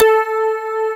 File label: digi decay; AKAI S6000 CD-ROM - Volume 1; SYNTHESIZER